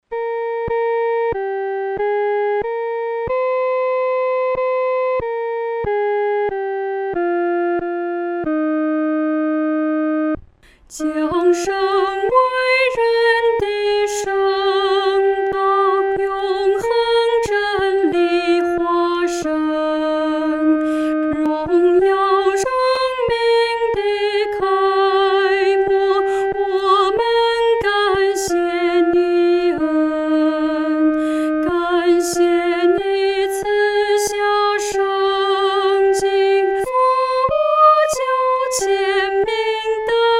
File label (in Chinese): 独唱 第一声